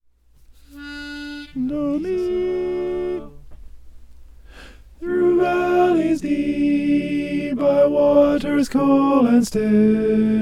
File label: trusting the shepherd alto